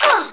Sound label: fall; Alien Arena; ai